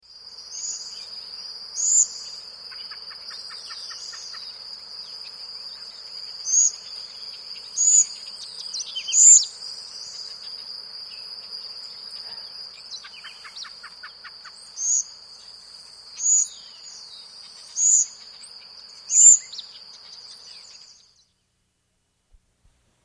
Yellow-Olive Flycatcher, S. America, Natures Songs